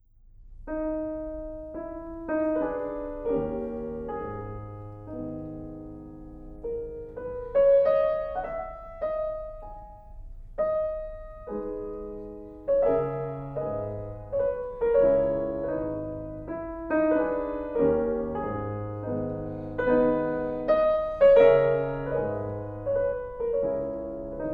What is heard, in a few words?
Mazurka in G-sharp minor